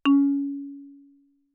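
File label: Marimba cord fortissimo Db2; MIS Pitches - 2014; Percussion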